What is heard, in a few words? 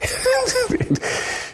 Laugh; hunter biden